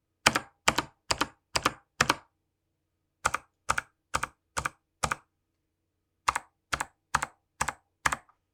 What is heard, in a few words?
Leer Enter Back